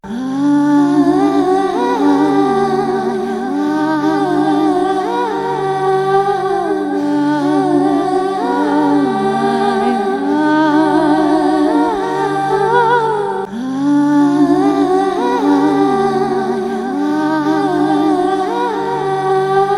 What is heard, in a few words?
vocal test vocals; LANDR